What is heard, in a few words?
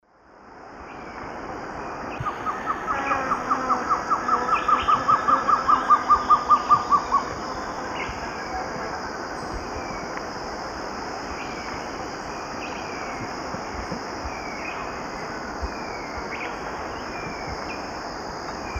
Trogon curucui, song; S. America; Eastern Lowlands